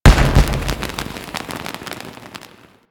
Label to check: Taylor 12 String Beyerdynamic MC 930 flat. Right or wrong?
Wrong